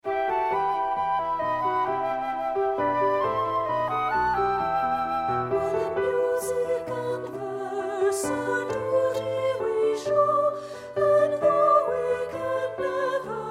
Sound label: alto